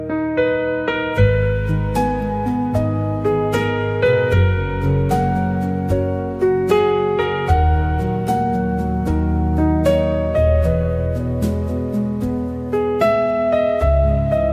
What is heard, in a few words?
piano ring tone; indian melody ringtone